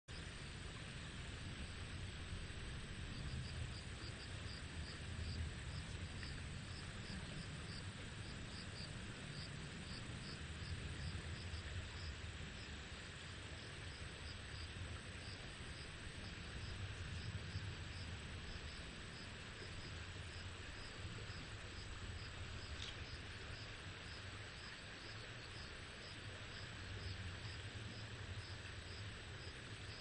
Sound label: riverside; Kyoto U; Ashiu